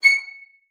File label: VIOLIN SPICC, C#7; Xsample VOL-5